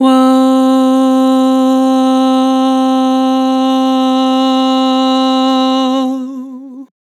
Vibrato "Woah", Vocals; Harmor Vocals

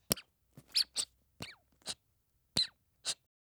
Cat Toy Mouse Squeaking; Fairlight Sound Lib

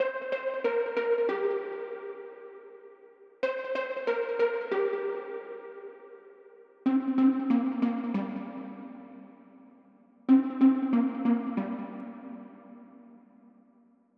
140 BPM C Min Pluck; Cymatics; Gems Free Teaser Pack; Cymatics Gems Vol 5; Just Us

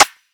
Rim, Drill Drum Kit; Drum One Shots; Spill; Cymatics; PARADOX II

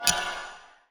UI Arcane Select; User Interface

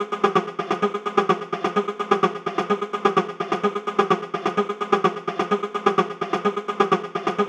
Melodic Loop Fmin Analog 128, Melodic Techno; Ghosthack; AC2024 - Day 12 - Melodic Techno

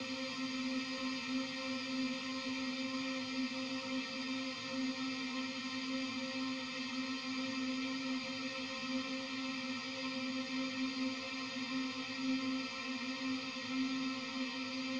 128 BPM C Min Ambience; House; Loop Stems; Cymatics; Breakdown Loops; Golden Hour

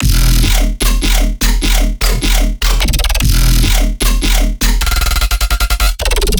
Bass Loop 150 E; Trap and Dubstep; Savage; Ghosthack; Free Trap and Dubstep Samples 2018

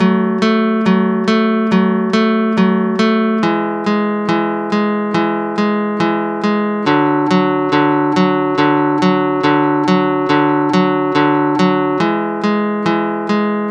Guitar 140 BPM F#min; Guitar Loops; Shadows; GhostHack; Ghosthack Advent Calendar 2021 - Day 10 - Guitar Loops and One-Shots

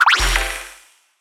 Gravity Switch FX FMaj7; Video Game; Cymatics; Video Game Sample Pack Vol 1

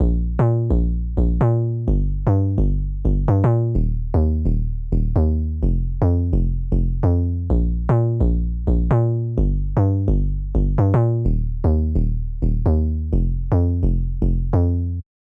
128 BPM Bb Maj Bass, House; Drop Loops; Cymatics; Butter

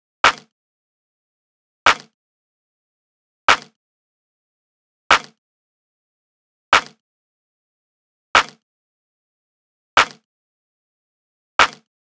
Drum Loop 74 BPM Clap, Lofi; Nameless; Cymatics; ANALOG EVOLUTION Beta

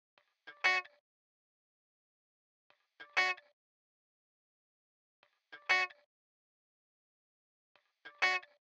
95 BPM B Min Hi Guitar; Loop Stems; Cymatics; Miami Guitar Pack - Pt 1; Breeze

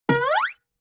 Guitar Plink Slide, Vintage Cartoon; Musical Elements

